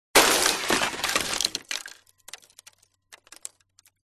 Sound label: crashes wood; Audio Mangler